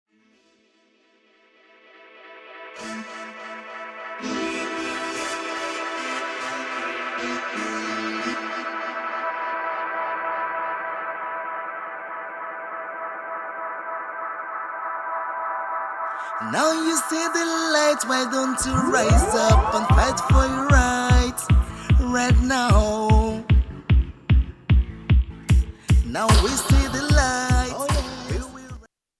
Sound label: Dub